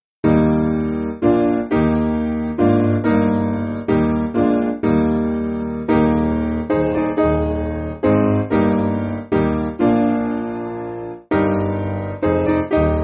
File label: Piano, Backing Files for Churches; Eb